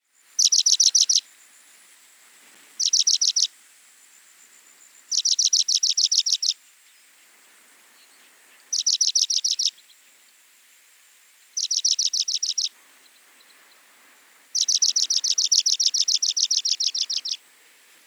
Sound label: African Pipit